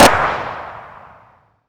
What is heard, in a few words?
Weapons M39 Rounds End; DCS